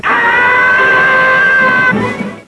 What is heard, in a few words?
AAAHHHHHH; Tom And Jerry